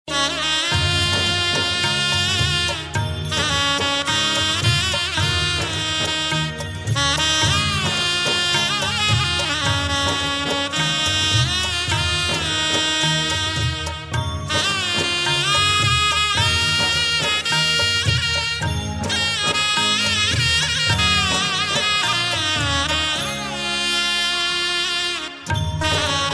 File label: Instrumental